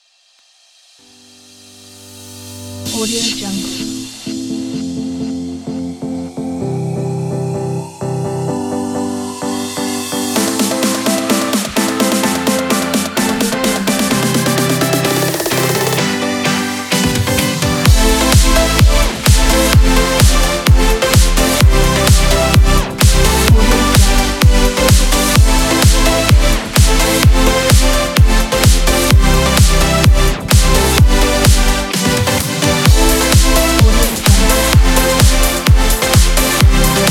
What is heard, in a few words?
dance